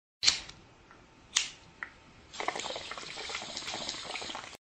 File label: bong